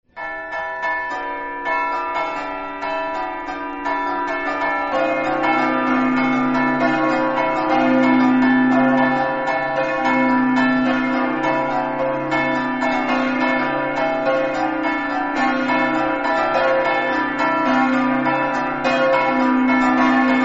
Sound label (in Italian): reana, campane